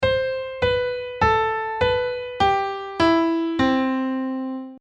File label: Major Scale